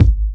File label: clean bass drum sample g sharp key